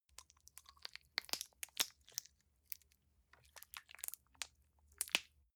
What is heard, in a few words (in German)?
gel dispenser press squish long, badezimmer